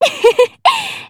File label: Ehehe; exusiai